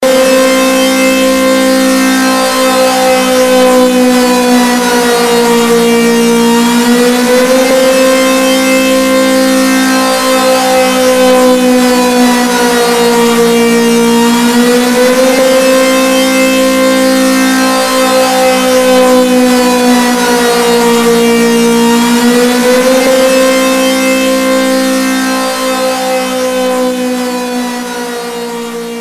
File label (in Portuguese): sirene de panico